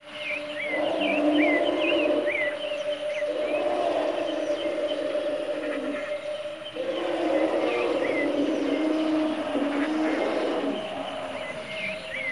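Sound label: Ambience Swamp Land; Ladianes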